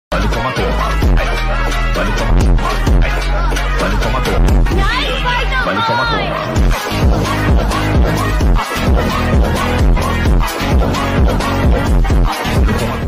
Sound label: TikTok Edit Music